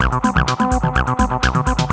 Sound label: ACID JAM